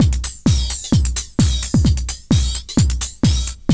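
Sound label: flange; AMG - Now CD-ROM (Roland); Megabass 2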